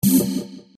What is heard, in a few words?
hoofer